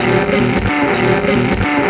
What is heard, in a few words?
Crunch, Electric Guitar; Sonic Foundry (Sony Creative Software) - Friendly Fire